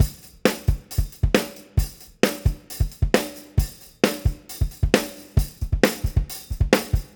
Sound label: Beat Loops, 134; Zero-G; Monster Beats